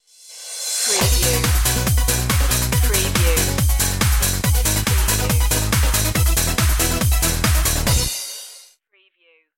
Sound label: swirling beats stinger